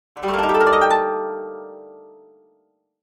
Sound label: Koto